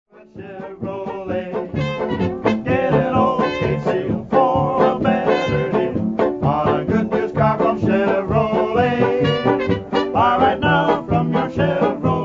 CONCERTINA WALTZ

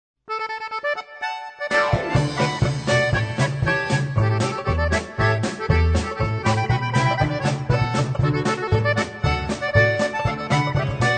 Slovenian polka medley

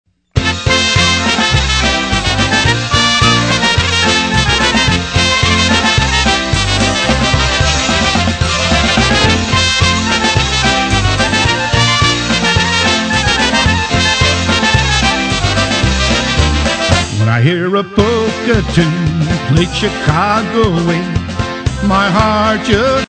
polka music

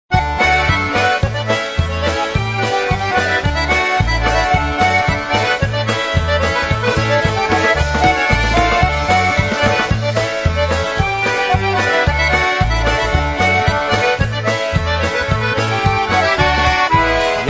polka

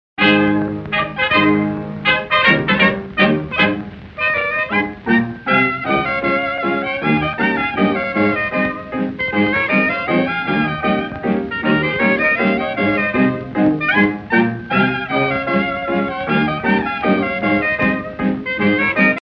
LAENDLER

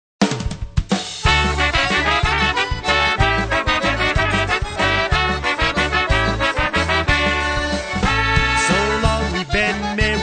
OBEREK

